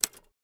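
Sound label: Typewriter Single Key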